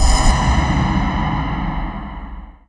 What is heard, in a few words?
machine hit, machines; Half Life Model Pack; Half Life 2